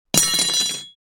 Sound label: Dropping wrenches on the floor